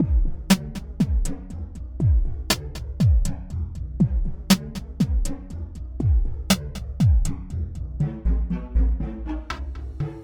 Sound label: Strings Loop